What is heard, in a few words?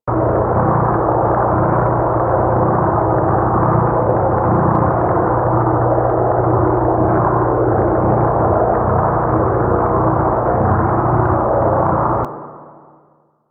Water Pump Flow Loop; Ambience; Props; Warframe; Grineer Forest